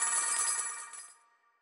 count Up End; netent

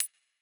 count Up; netent

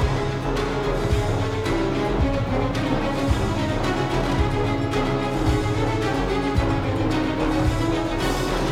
bonus Music Layer; netent

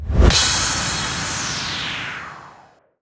big win text; netent

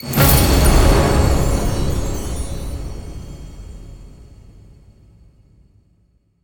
big Win End; netent

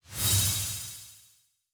star whoosh; netent

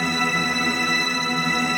mega win loop

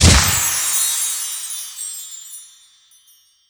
explosion medium win; netent

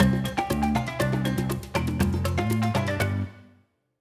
Spin jingle

